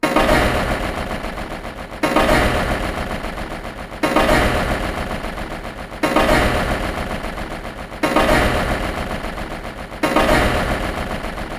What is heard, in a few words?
comb binder fed through a comb filter